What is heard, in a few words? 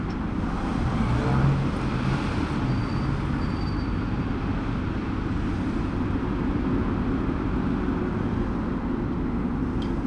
city; langeles